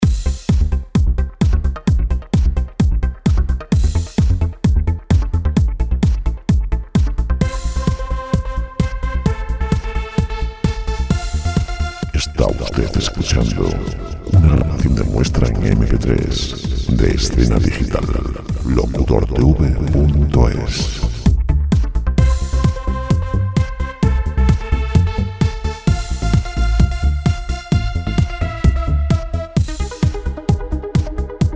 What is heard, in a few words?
130 dance